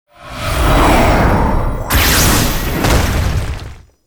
mega skill; hero sound; gengguimega

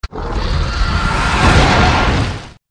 skill roar

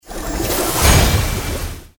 attack; hero

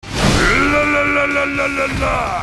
wind; battle

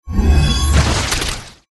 attack; hero sound

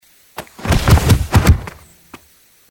attack; hero

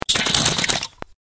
skill snake

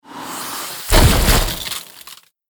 attack; hero sound

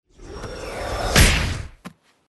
attack; hero sound

